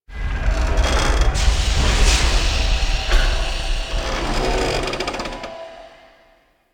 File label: Asteroid Big Ship Groans, Ambience; Warframe; Grineer Asteroid